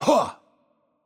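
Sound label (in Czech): Hurt Light, Czech; cs CZ; VO; bloom minigame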